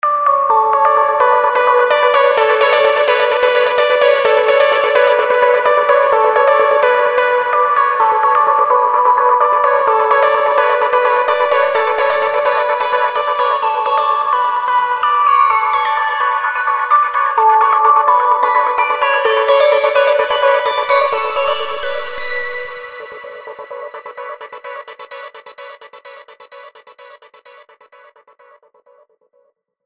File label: Avenger 2 Background Sequence; Vengeance Sound; Factory 2